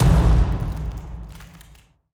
lands on distant mound; dung defender

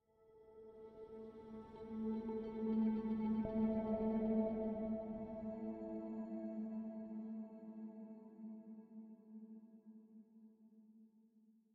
85bpm; Legends of Pegasus; windows gamerip 2012

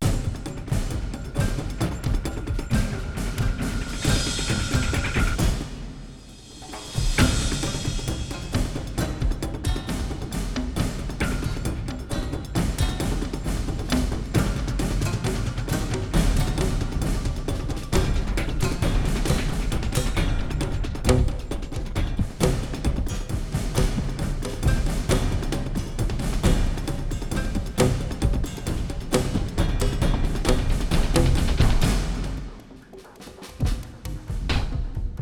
Perc; Crysis

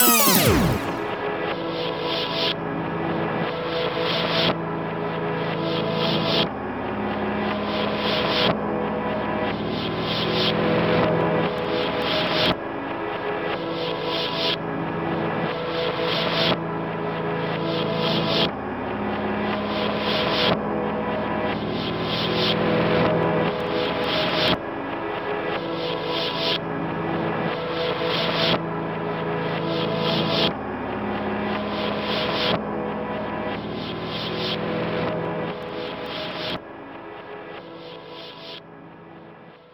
Jingle slow Rev; Blinx 2 Masters of Time and Space; Xbox

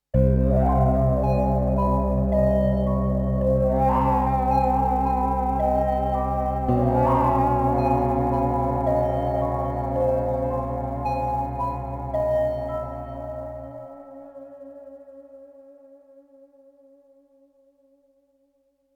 Scary Amb Stinger; dying light gamerip 2015

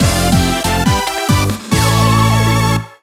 Vs Win

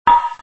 Browse Sound; android

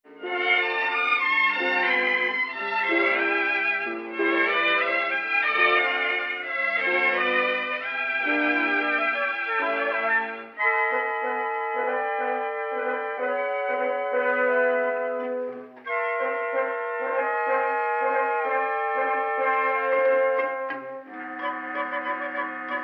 Elevator Muzak

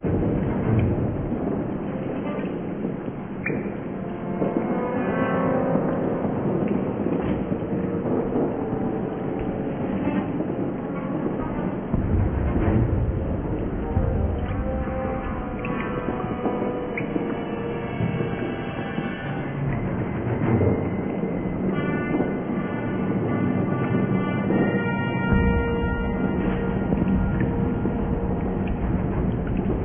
Sewer Ambient Music

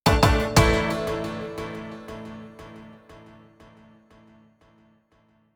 Firecrackers positive

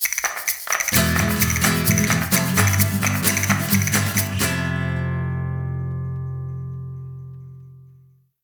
Match Start; Don Flamenco

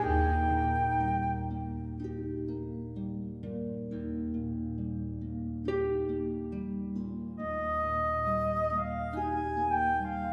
Calm Loop

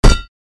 Spikeball; Infiltrating The Airship; Warehouse

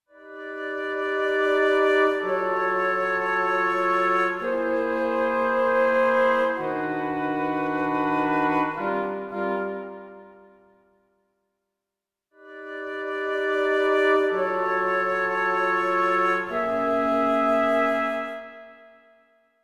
bgm home; Meitantei Pikachu Shin Konbi Tanjo

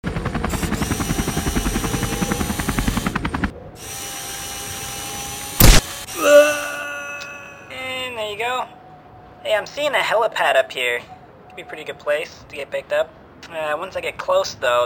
Mini Helicopter; Fleeing The Comples

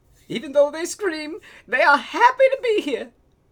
Male Reaction

